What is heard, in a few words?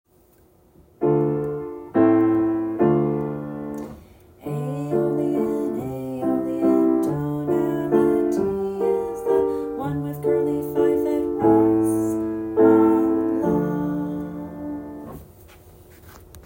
Aeolian tonality